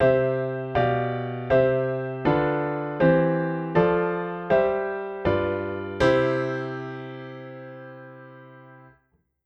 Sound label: different Piano